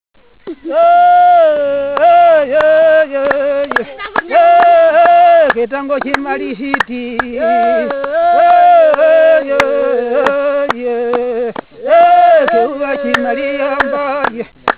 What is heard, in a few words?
clapping